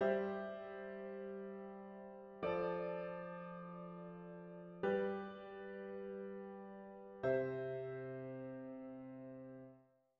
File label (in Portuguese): Estudo de Harmonia cadência com acorde de subdominante com sexta acrescentada